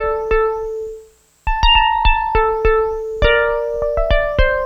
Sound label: monkey funk; Loops; Electronic